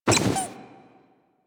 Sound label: investigate pickup plushie; Warframe; Cephalon Weave